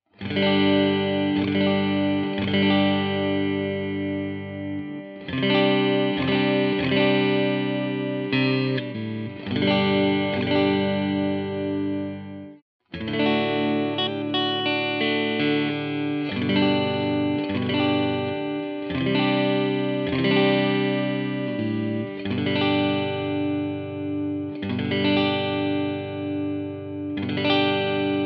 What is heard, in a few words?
Turk Middle Clean, pickups; Revel